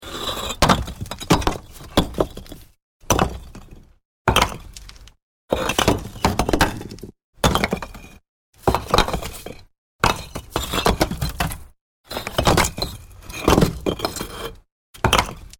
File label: Terracotta house building bricks being moved and tumbling to the floor; Falling Bricks; Debris; Materials